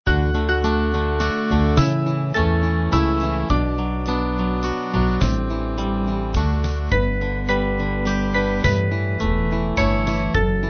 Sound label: Band